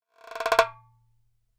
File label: darbuka FX